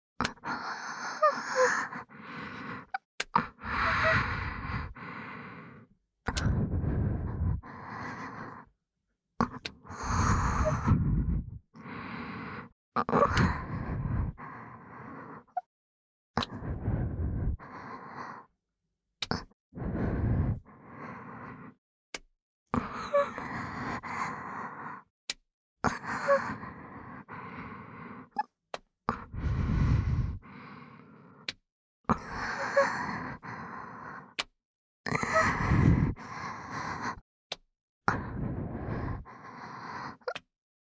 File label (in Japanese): (右) おまけの耳元囁き喘ぎエッチ, ASMR; 白耳; パンパン系効果音版